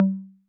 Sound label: Conga Low 808 Sat; Saturated